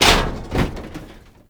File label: Military Checkpoint Wall Destroy; Warframe; Ambience; Nineteen Ninety Nine